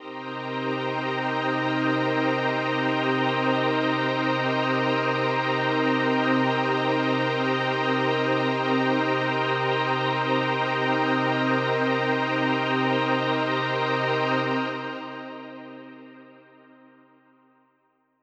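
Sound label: Ambi String C minor 7, synth orchestra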